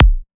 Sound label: new kick